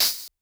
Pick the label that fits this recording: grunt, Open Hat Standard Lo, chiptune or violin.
Open Hat Standard Lo